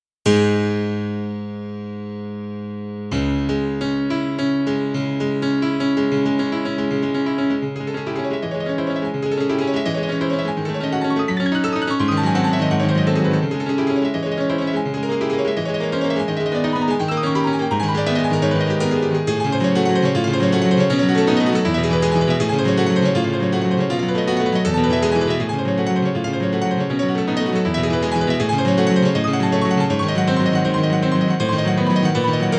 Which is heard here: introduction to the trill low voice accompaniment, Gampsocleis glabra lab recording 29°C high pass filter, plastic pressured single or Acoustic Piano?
Acoustic Piano